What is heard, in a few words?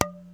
tap sound